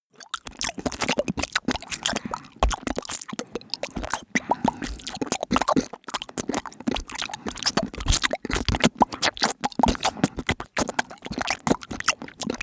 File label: smack lips; soundpool